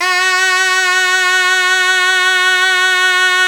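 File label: SHENAI, Shenai (Vib)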